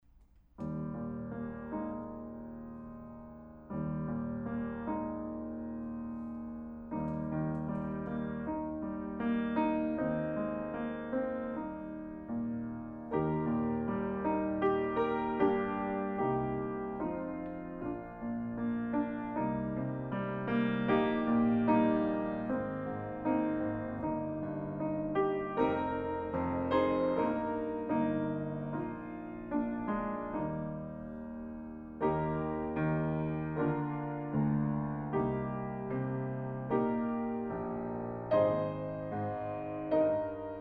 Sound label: backing track; bonnie charlie